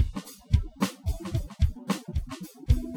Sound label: Latino